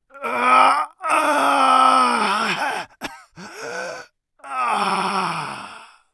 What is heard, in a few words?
agony; Escape from Tarkov